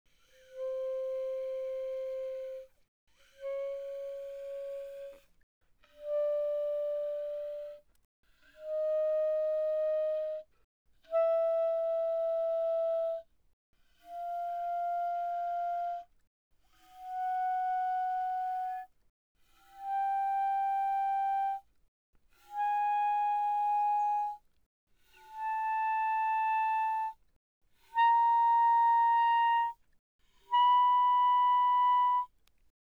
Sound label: Eb Clar pp C5 B5, Eb clarinet, woodwinds